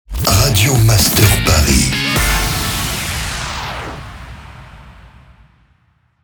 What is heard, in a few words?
Jingle SLOW; Paris; Radio Master PARIS